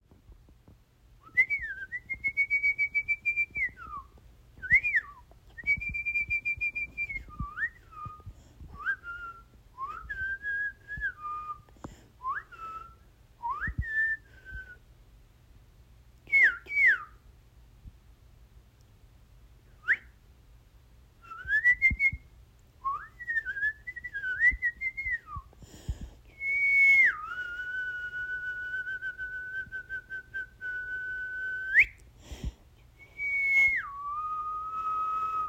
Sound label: Whistle